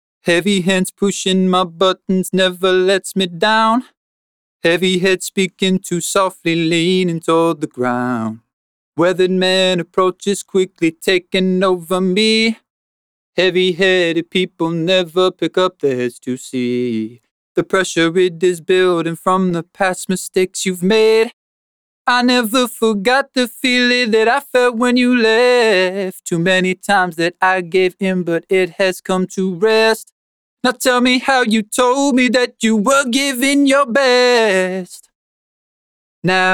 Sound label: Vocal Dry F# minor 110 bpm, Acapella; Male; Ghosthack; Not Easy